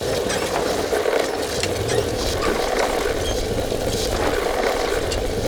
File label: Wheel Wood; bad piggies; android; mobile; windows